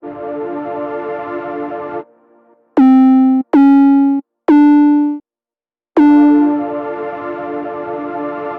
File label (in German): spielt auf tastatur herum; 808 Producing Guide